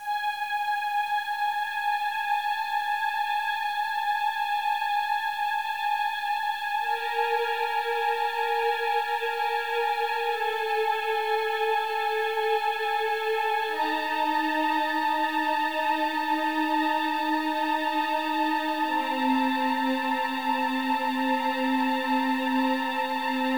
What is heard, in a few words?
horror